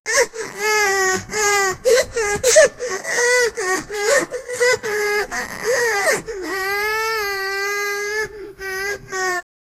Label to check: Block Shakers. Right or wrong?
Wrong